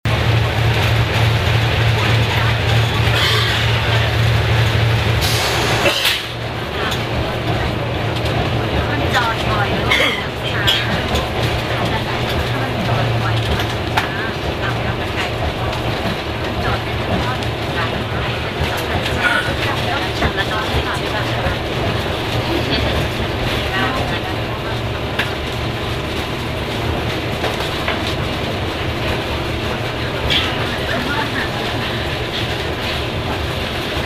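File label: on train ambience, Thailand; south east asia street recordings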